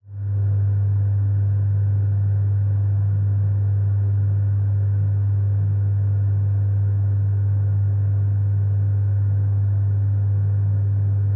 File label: toilet ventilation fan off